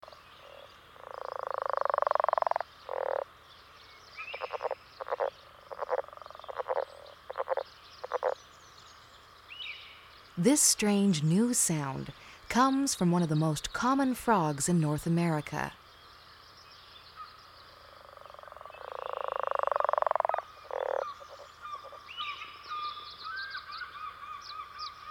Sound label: leopard frog